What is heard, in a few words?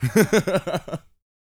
LAUGH, Jazz FX; Spectrasonics Vocal Planet